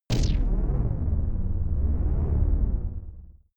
shot wavy darkness, pulsieren

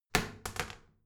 toilet seat lid wall open heavy, badezimmer

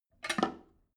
drain valve open regular, badezimmer

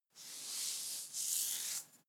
hair short hard comb single, friseur; berufe

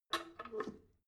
drain valve close soft, Badezimmer; haus garten

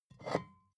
bottle vodka pick up slide, gastronomie

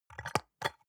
soap dispenser press squish, badezimmer

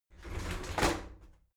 shower slide doors open regular, tueren

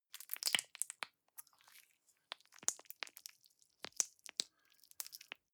gel dispenser press squish long, badezimmer

